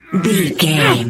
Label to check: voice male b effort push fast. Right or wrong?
Right